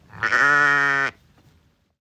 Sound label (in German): Schaf Mäh; Wanderschäfer - Harburg; One Shot; 24-Bit 192 kHz